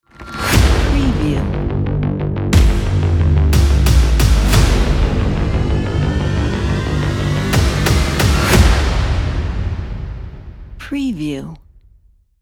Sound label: powerful epic logo